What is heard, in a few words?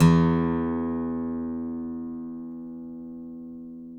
ACGT E2, ACCGTR PICK